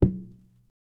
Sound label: the sound of a hollow, impacts